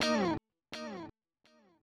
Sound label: ELEC GUIT, House, Guitar; eJay